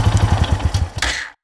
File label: ATV Stop; Vehicles